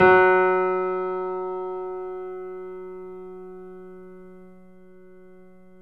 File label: F#, Boesendorfer, 3D Pianos